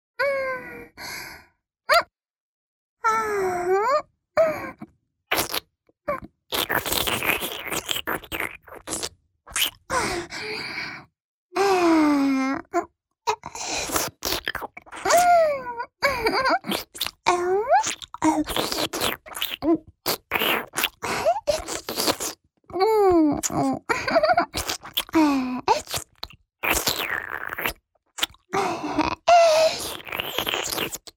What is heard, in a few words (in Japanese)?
フェラチオ(弱):亀頭・陰茎を機嫌よく舐める